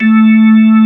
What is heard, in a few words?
HAM A4 -R, KEYBORD